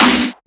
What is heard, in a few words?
SnareClap; FLPRO